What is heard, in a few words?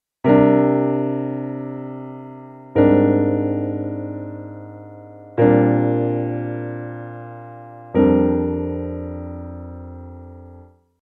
Sad Chords, piano